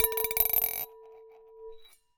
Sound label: Marble Rattle, Large Wine Glass